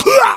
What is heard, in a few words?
hunter pain short; player; voice; source engine; left 4 dead 2